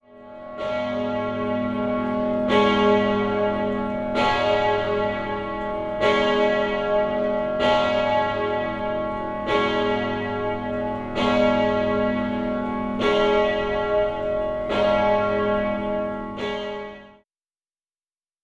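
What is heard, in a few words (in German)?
as Glocken verschiedener Qualität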